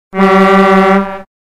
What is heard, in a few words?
kirmes jingle horn